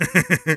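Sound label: laugh short, German; Kurzes lachen 4; Sniper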